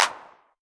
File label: Clap, Novation Drumstation; DrumstationV1